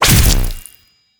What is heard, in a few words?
Boss Chain Damage; helltaker